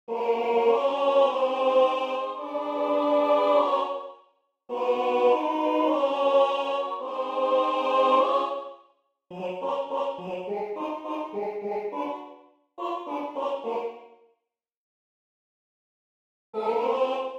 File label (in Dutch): Bas